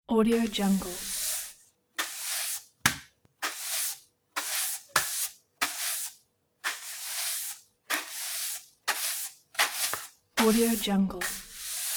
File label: stereo sweeping broom